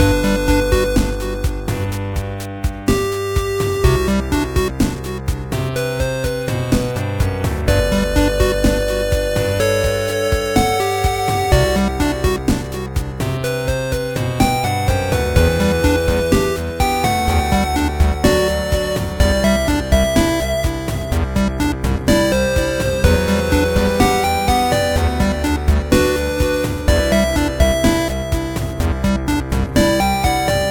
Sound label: Sci-Fi; Super Mustache Windows gamerip 2016